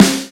normal hit clap

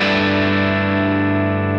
0 3 2 0 x 3 G6add9

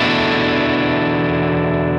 Em7(11)

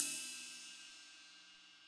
ride

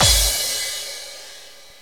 cymbol

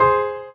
Drop Lead; osu

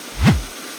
held beat loop

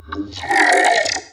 Growl

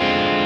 x 6 5 6 5 Dm7b5

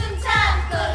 breath; osu

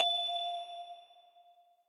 bell

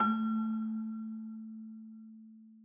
Back Celesta Lower A

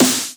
snare

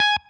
Staccato

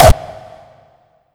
normal hit whistle

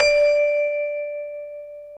soft hit whistle

